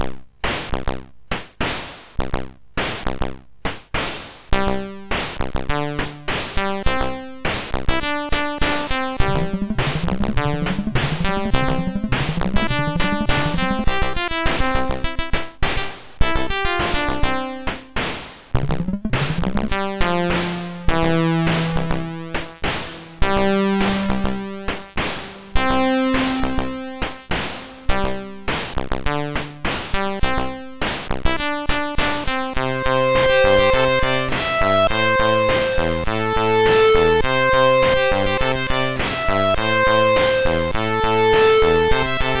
Super Synth Sample, sid MOS6581R3

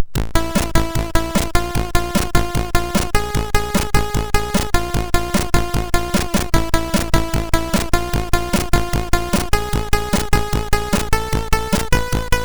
sid, MOS6581R2

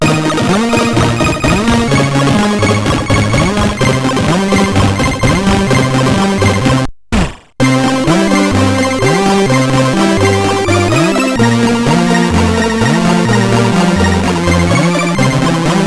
Music Samples, sid, CSG8580R5

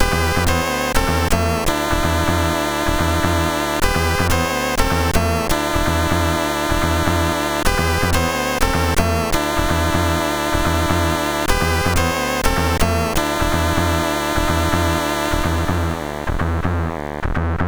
Loop, sid CSG8580

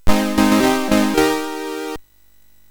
Loopz, MOS6581R3

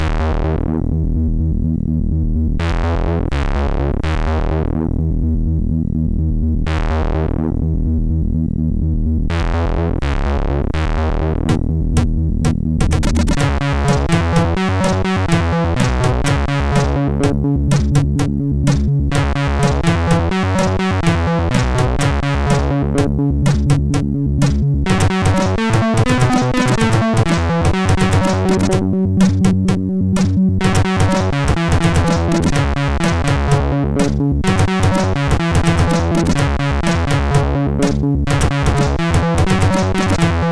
sid MOS6581R4; Line It Up

